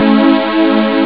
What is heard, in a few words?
chord; Amiga Soundtracker Sample Packs